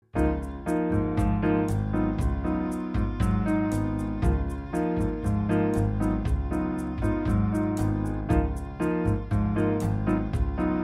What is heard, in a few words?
Instrumental Track; auditions